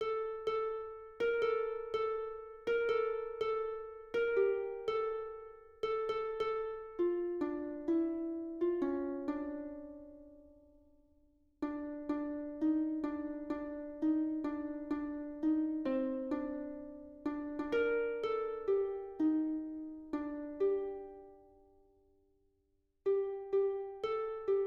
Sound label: aboriginal father melody